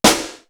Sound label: SNARE CENTER; East West; Global Sounds